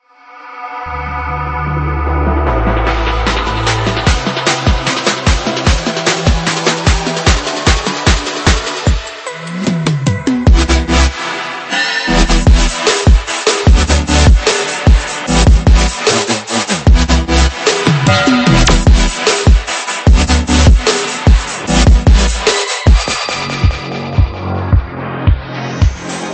Impulsive Future Bass; Noiiz

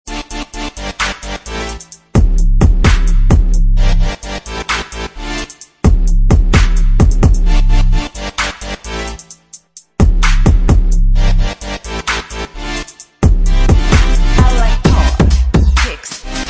HARD KICKS; HQO

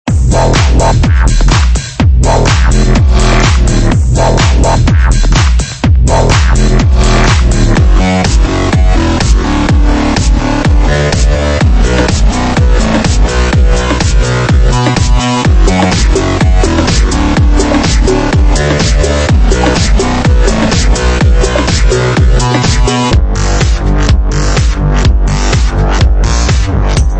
Elektro House Basslines; Carma Studio

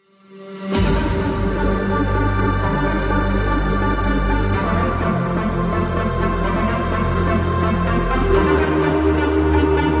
Heavy Bass House

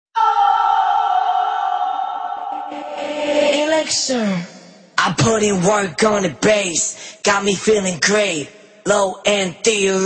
Hype Vocals

